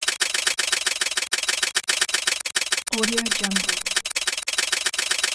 typewriter

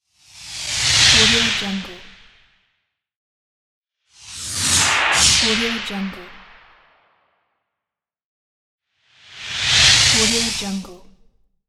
whoosh

